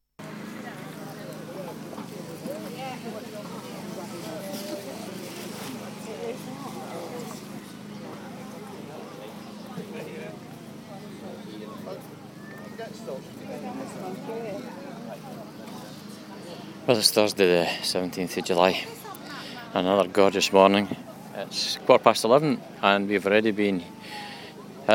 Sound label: car boot soundscape; vacation; strawberryfields